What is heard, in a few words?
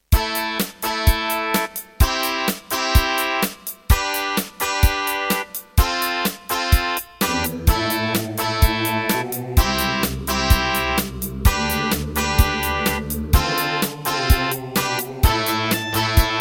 Wave 1 [Yucatan FX (Turtle Beach Cancun FX Clone)]; Serdaco; raptor call of the shadows